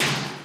Heavy Metal, Alesis D4 drum machine

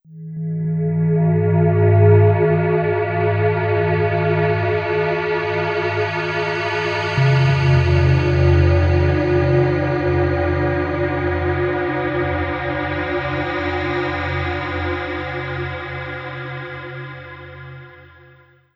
Bell Atmosphere; Sonic Foundry (Sony Creative Software); Slow Silhouette

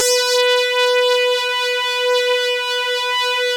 SYN Saw Lead B 4, SYN JD; Best Service Gigapack 1

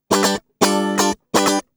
GUI 120 5bG; Best Service Gigapack 2

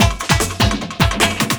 LOOP, 150 BPM

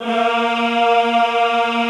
EHH A#2, Classical Choir; Best Service

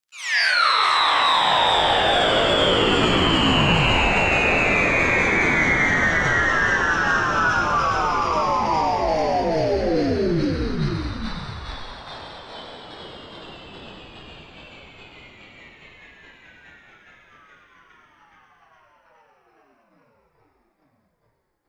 Old Games; Antidote Advent; Infected Track Drums Ableton Project

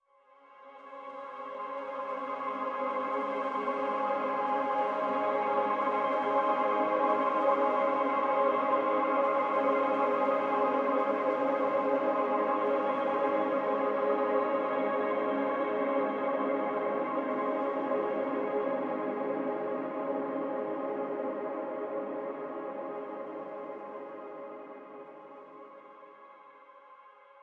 140 PAD LAYER Cmaj

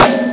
Ugly Acoustic, Snares; Drums

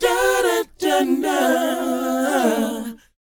DOWOP G; ILIO; Vocal Planet

